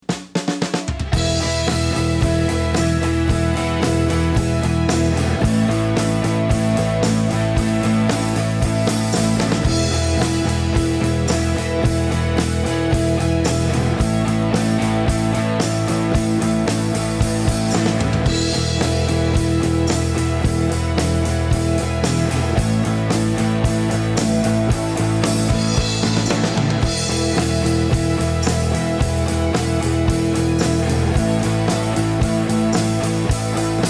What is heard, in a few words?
backing track